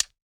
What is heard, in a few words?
hat little, closed hat; Hip-Hop; Drum One Shots; SD CAICOS Hip-Hop Sample Pack; Slate